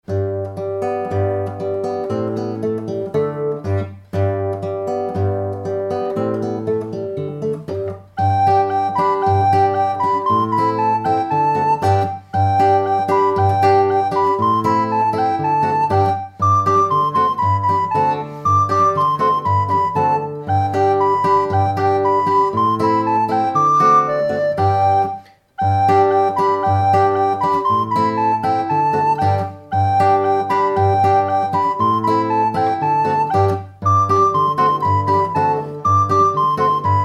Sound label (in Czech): doprovod a fletna; koledy